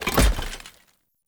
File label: Foley Fall knee Dirt; Enemy; Nimrod